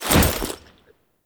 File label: foley jump; Warframe; Characters; Kahl